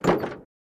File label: Cessna Door Open